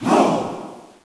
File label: drum